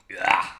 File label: argh